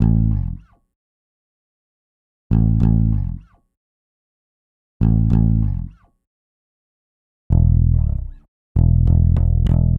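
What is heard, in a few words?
Bass, Hip Hop Exotica, 096 A minor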